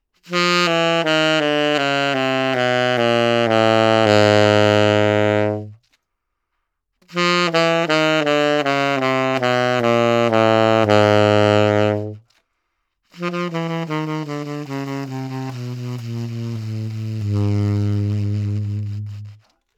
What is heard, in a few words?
Low Notes; SBA